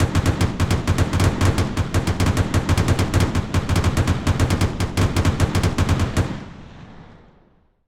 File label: combat atmosphere; World of Tanks